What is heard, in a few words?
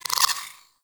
Sniper Zoom Out; Warframe; Tenno